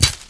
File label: bush; Mafie 1